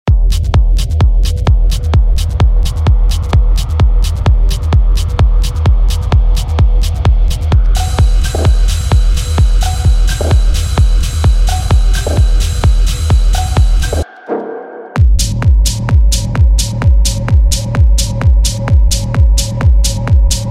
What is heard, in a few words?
twisted techno